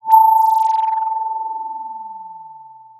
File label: Sound of a phone ringing